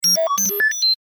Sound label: Start-Up Melody, robot; Start-Up Melody - Perfect for Robots, Science Fiction Elements, Toys Or Games; CraftedSignals